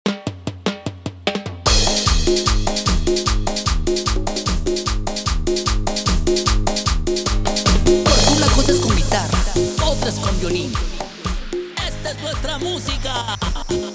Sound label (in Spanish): CAMBIO DE RITMO